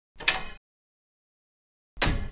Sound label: Interact Door Open Close; Opsive; UltimateCharacterController